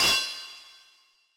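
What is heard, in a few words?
dull muffled metallic clang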